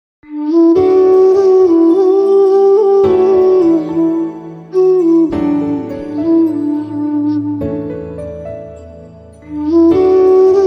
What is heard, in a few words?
Beautiful Flute Ringtone; ringtones